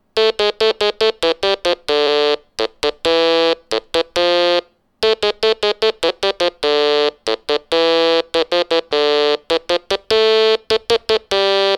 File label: Stylophone sample